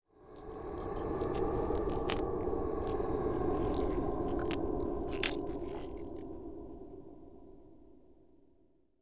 breath of death target

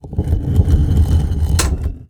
TURN STONE

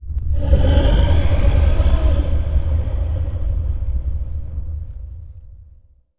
FEAR CAST

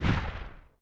FIREBALL COLLIDE

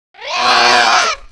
die; mahlendur

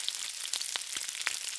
pan frying short

